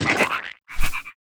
VOLCANIC DEMOLIS PEACE DAMAGE

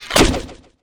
Bow Ice Cast; bullet

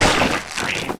WAR DAMAGE

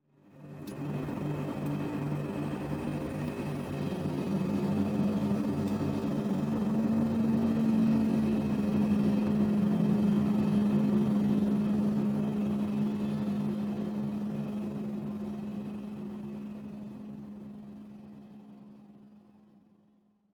Ghost Machine, ambience

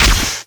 gun Metal, Damage

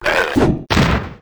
attack; SNATCHERREX; Mob

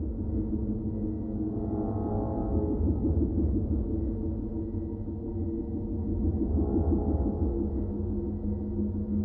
Temple, ambience

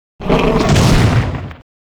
attack

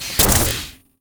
Heavy Damage

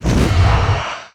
FLAME ARROW

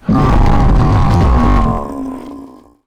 war die; DAGAN; Mob

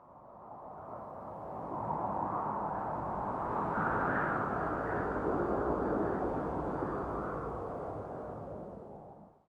Desert Ghost Wind, ambience

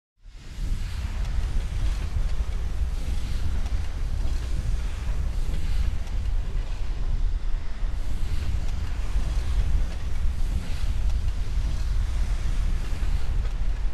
Gass Machine, ambience

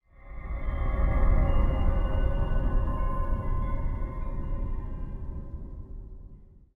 Cristal, ambience

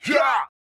attack; ACCRETIA

